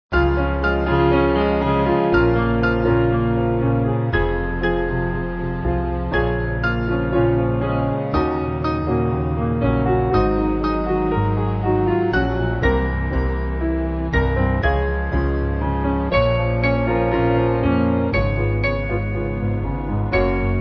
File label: Piano